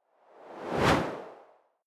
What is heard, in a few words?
whoosh simple